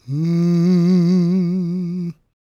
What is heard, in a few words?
GOSP MALE, SOLO; Gospel